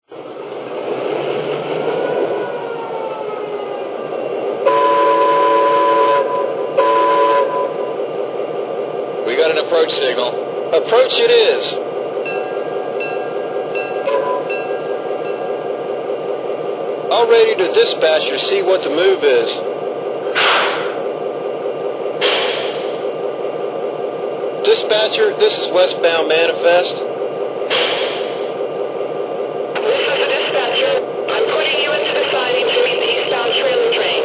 GP7 9, trains

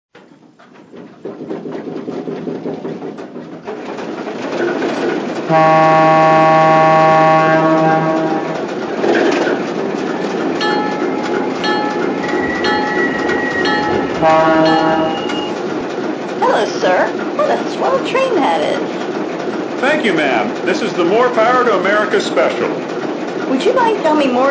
Alco PA, trains

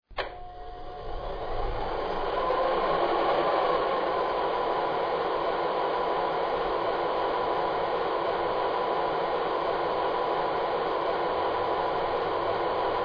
GG1

